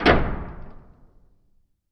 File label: DOOR Sci-Fi Metal Close; mono; Universal Sound FX; DOORS & GATES